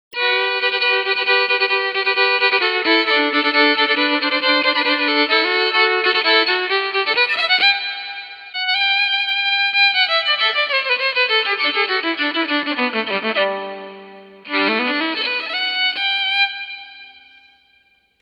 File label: Fiddle; Quilter Steelaire Pro